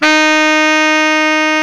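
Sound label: SAX Baritone Sax D#4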